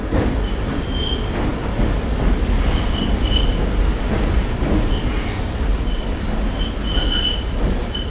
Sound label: rub, Train; OpenBVE